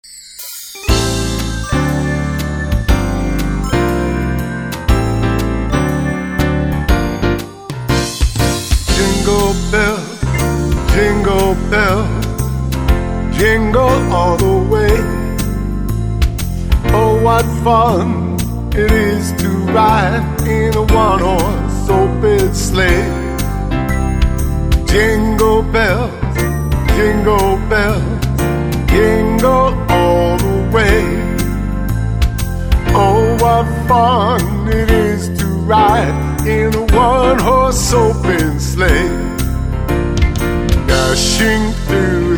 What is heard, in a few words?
Jingle Bells